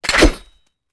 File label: bell; Seer; GamigoNA